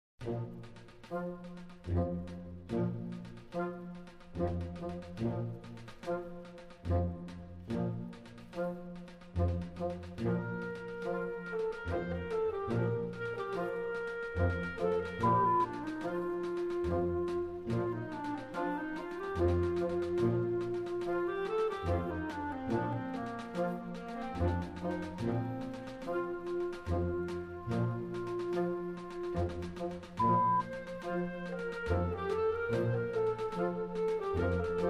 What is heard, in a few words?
BOLERO noise